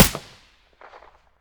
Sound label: aps silencer mono; STALKER; IWP